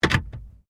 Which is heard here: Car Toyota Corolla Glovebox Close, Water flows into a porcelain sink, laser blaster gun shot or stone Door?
Car Toyota Corolla Glovebox Close